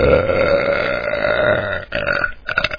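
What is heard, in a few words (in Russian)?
отрыжка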